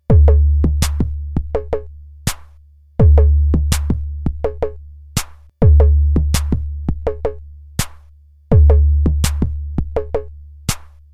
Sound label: ingame background; ld21 ESCape